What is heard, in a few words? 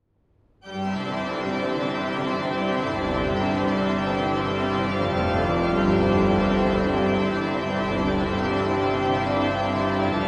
Toccata; Leclerc